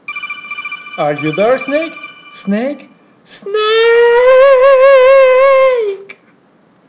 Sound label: Game Over; Super HUGS Revolution 98; sfx